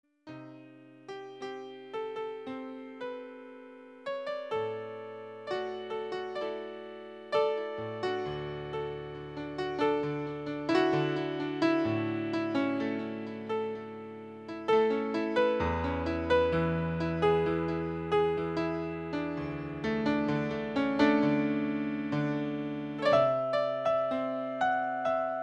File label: jingle